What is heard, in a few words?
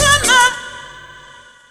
fx divox; E Lab; XTc Files of House